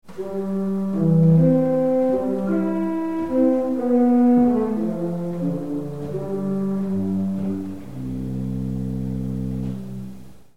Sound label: ped prin